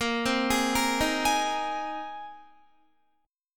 Bb 7th Suspended 2nd Suspended 4th Bb, Guitar 4E 4A 5D 5G 5C 6F; Bb7sus2sus4 arpeggiated; 18,15,18,15,15,15